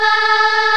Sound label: CHOIR D4; GOTHIC; East West; Global Sounds